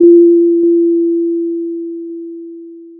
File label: pop, Sound of a doorbell